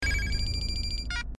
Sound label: damage